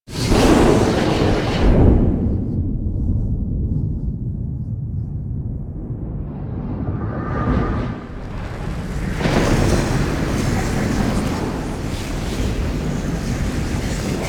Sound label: Throne Room Blackhole; Warframe; The New War